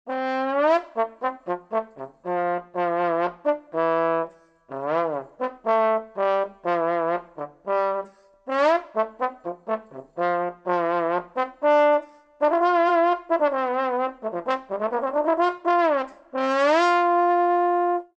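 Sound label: trombone solo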